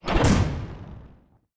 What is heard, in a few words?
Sound Slot Lever